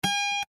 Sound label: Autoharp Pluck Single String; Miscellaneous Musical; Blastwave